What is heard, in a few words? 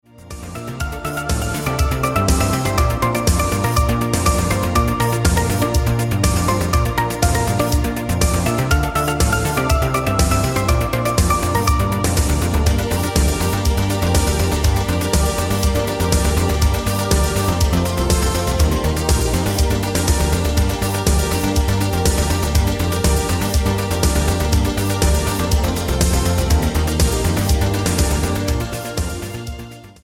disco orchestra